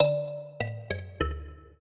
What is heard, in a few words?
trio, mbira; Mozart